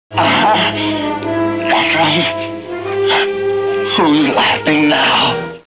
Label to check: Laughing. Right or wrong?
Right